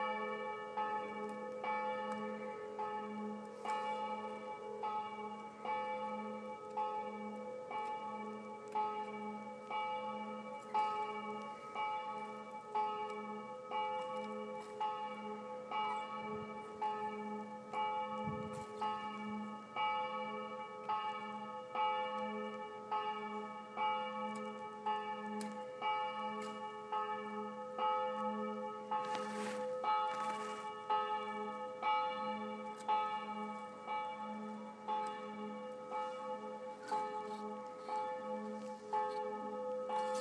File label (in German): Kirchglocken in Stammheim